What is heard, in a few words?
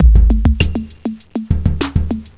Jazz